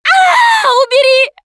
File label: scream while pounced, russian, Survivor Voice Producer